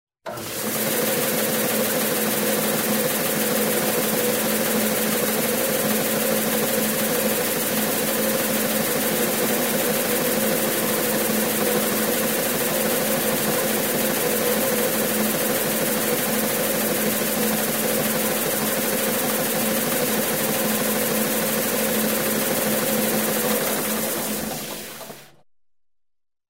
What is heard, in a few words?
traffic